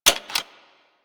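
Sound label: submachine gun Alt Mech; Weapons; Warframe; Tenno; Sundial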